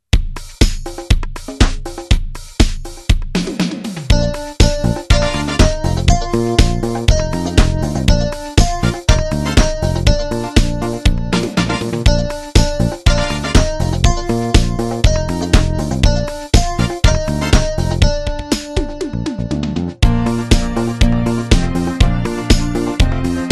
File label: Au clair duet faster